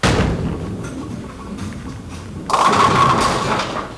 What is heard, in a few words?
Bowling Strike; Working Model Program